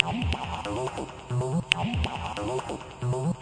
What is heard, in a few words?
acidline; melodic loops; synth and electronic loops; bigfish